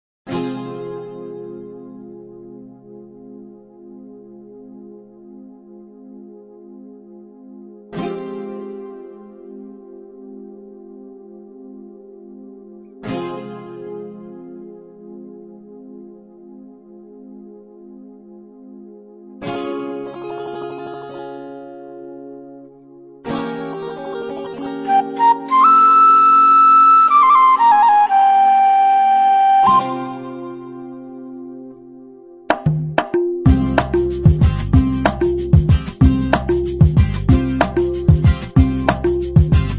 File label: Karaoke, Tamil